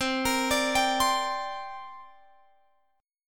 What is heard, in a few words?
C 7th Suspended 2nd, Guitar, Open F; C7sus2 arpeggiated